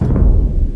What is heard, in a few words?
confirm; aoe2